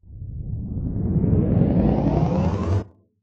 Power Rev Down

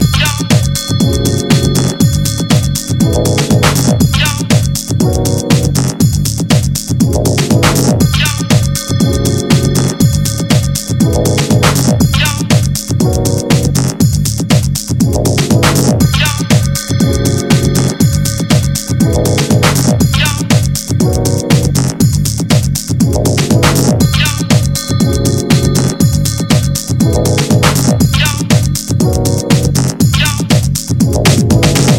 Streets Beats; MusicShorts

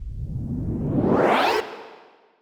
Power Rev

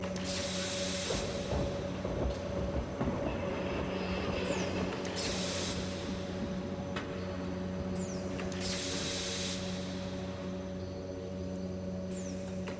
bed Loop; beds

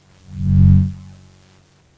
Slide; soundhacks

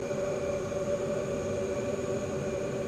bed Loop; beds

